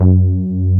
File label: SLAM F#2; Best Service Alpha Dance vol.2; SLAM SEQ.2